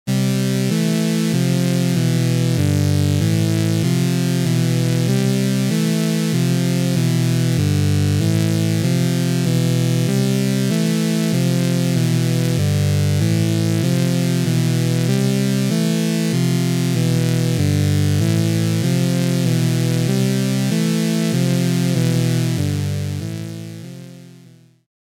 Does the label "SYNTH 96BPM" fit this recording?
Yes